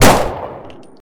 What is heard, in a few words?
P226